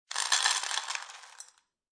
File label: Dog Food Poured Into Dog Bowl, Foley, Dog Food Being Poured Into Metal Dog Bowl; Pouring